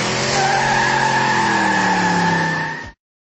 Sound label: engine